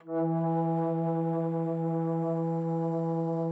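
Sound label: E3, bass flute; Xsample VOL-2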